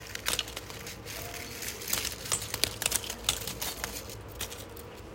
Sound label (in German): Laub rascheln